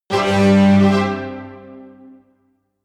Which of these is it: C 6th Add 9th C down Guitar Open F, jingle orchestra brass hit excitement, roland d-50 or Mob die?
jingle orchestra brass hit excitement